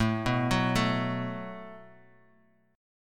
Gb Minor Double Flat 5th A, guitar

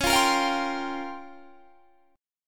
A sharp Minor 6th Add 9th C sharp, Guitar chord

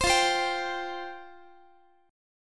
F Suspended 2nd F down Guitar; Fsus2 strummed; JGuitar

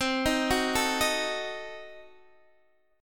C Diminished 7th down Guitar Open D

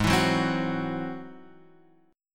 Ab Augmented 7th Ab down; Ab+7 strummed; JGuitar

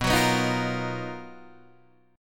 B Major Sharp 11th, Guitar, DADGAD

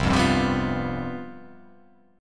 C Major 7th Suspended 4th

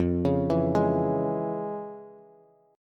F Minor Major 7th Sharp 5th, Bass; FmM7#5 arpeggiated; 13,16,14,13

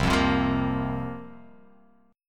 C 7th Suspended 4th, guitar

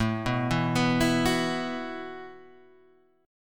A 6th Suspended 2nd, guitar; A62 Chord {5 2 2 4 5 2} arpeggiated

